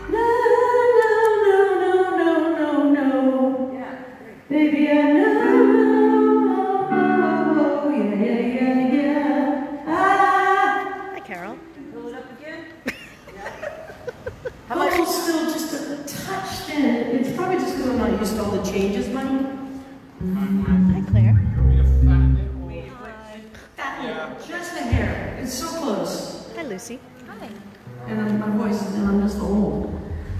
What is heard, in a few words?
vocals, rehearsal